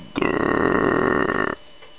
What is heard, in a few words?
tiger; zoo